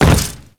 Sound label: Step Default, Footsteps; Warframe; Grineer